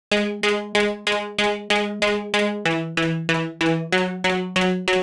Tek Synth Plucks

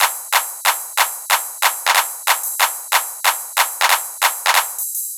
Top Loop Hardcore Ride Clap

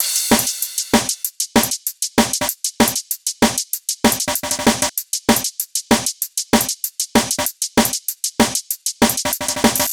Hat N Snare Loop

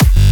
Kick Bass G

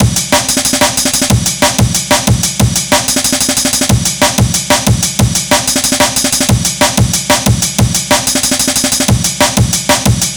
AMEN ROLLA

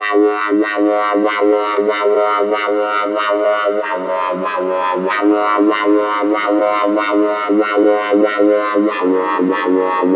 Tek Synth Lead

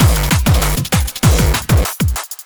DNB break

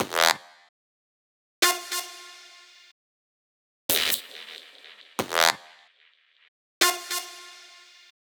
Tek Synths

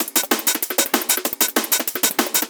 PERC LOOP

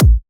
Kick G

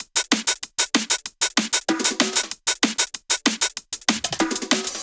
PERC LOOP

